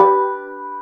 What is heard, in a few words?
combo power; tetrio